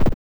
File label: BUMP HARD; SFX; Airport Runer